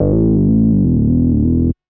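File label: synth bass, house, 125, A; eJay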